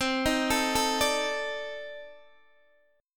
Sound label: C Minor 6th Add 9th C Guitar Open D